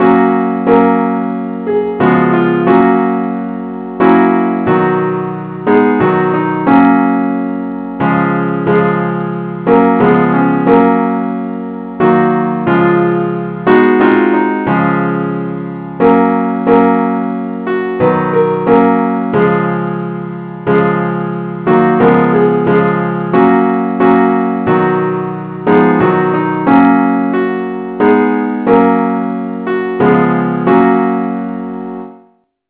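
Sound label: simple accompaniment key F sharp; i need thee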